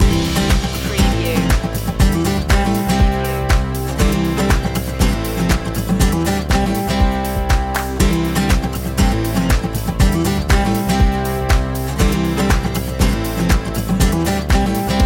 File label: uplifting acoustic guitar loop